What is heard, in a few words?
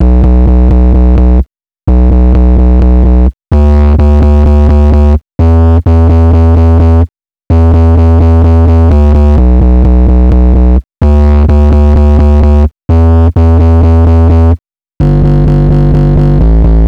Tube Bass, 128 BPM; Vengeance Samples; Vocal-Hook Kits; Song Kit